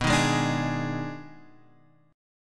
Eb Major 7th Suspended 4th Sharp 5th B

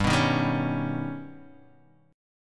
Eb Augmented 7th G

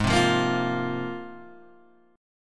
Ab 6th Add 9th down Guitar Open G

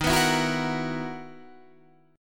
B Major 11th D sharp, guitar

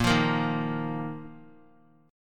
Bb Suspended 4th Bb down, chord; Bbsus4 strummed

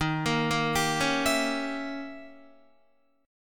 Eb 7th Flat 9th, Eb, Guitar; Eb7b9 arpeggiated; JGuitar

